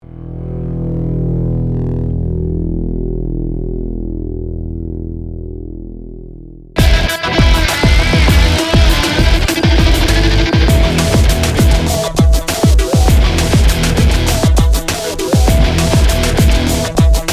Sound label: Background Music